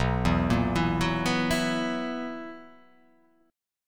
B Major 7th Suspended 4th B down

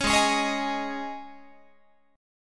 G sharp Suspended 4th G sharp, Guitar; strummed

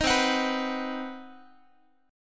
C Minor Major 7th Flat 5th chord, Guitar; strummed; JGuitar

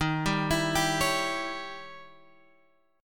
Eb 7th Flat 9th, Guitar Open G

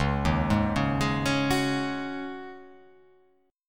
Db Suspended 2nd Suspended 4th, guitar chord; arpeggiated; JGuitar

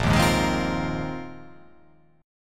A sharp 7th Suspended 2nd Suspended 4th

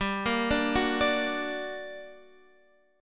G Minor Major 7th, down, Guitar, Standard; GmM7 arpeggiated; JGuitar

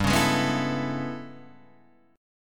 A Major 9th G, chord

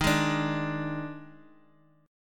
D sharp Major 7th Suspended 4th, D sharp, down; strummed; D#M7sus4; JGuitar

